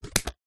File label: credit card cut in half with scissors; zapsplat